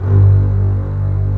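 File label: arcato string; OMI - Universe of Sounds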